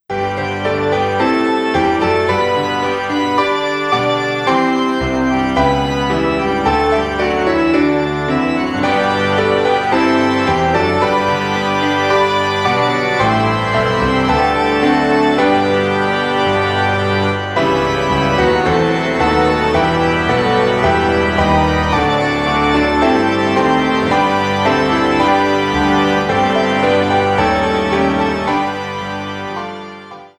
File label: Piano-Organ Short; Celebrating Grace